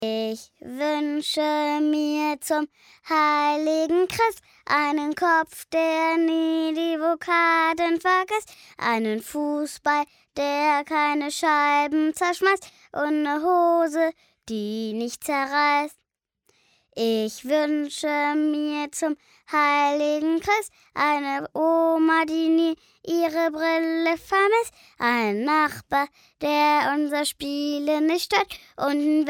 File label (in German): Gesang Ich wünsche mir